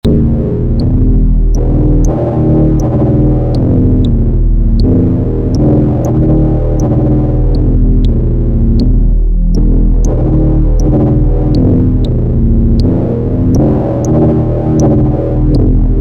synth foldspace

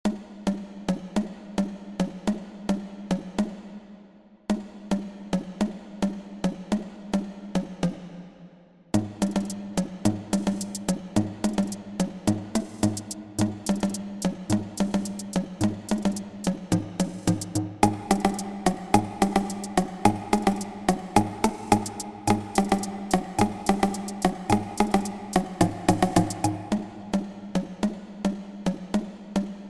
tiki instruments kaeke eke; chiqui audio

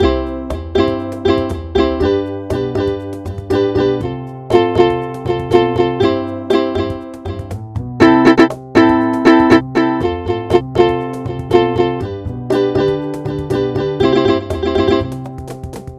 uke, ukulele chords; laf audio

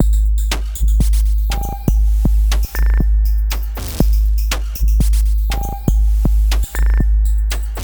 Ambient

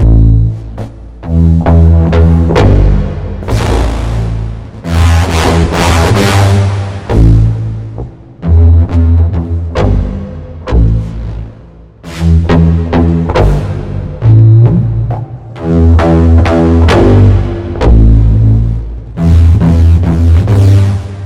guitar wet; folda; sentinel av

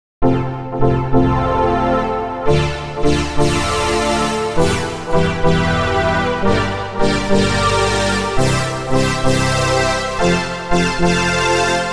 a nice synth choir with synth; the digital music

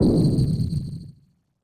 phase lazy cyber distortion; music and sound fx; music and sound fx master collection; nova sound

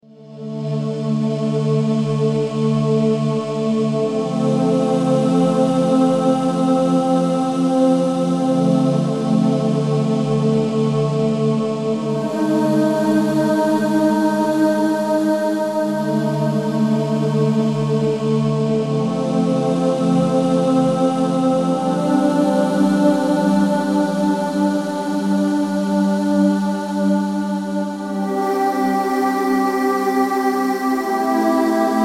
Heavenly Choir

